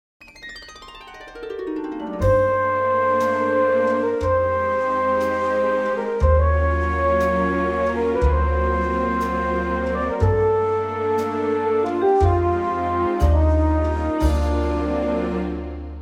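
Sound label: Gm orchestra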